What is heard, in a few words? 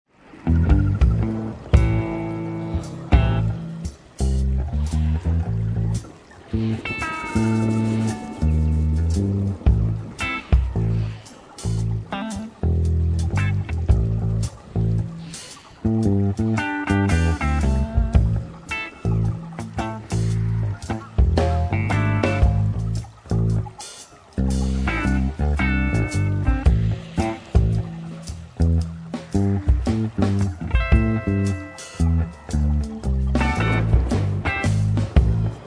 Jazzy Voodoo in the Swamp